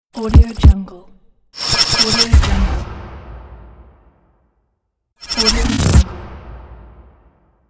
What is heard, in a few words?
glitch transition intro logo; intro-boom